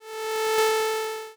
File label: wasp; Ulmos Adventure; pygame